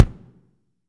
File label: kick; dsp2008 sound library